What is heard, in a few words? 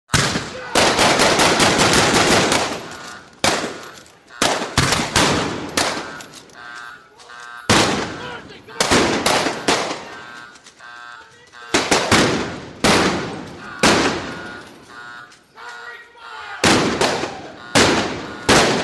Gun Fight